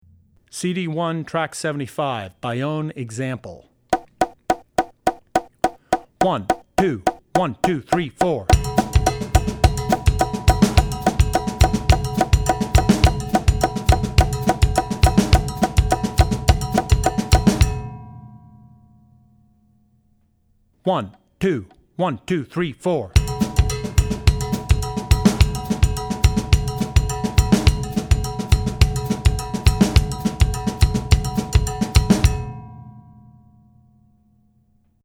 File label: Baiao; Drummer's Bible Tracks